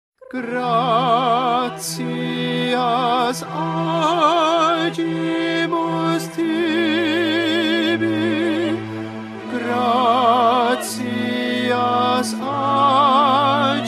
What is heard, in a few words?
gratias agimus tibi tenor